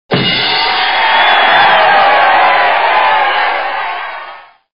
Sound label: beat; hl2dm